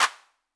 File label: clap; MFB-522